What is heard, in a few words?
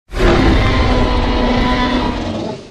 Trex Roar